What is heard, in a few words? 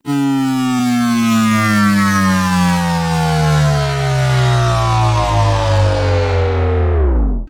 Tension Downlifter 128 BPM D; Cymatics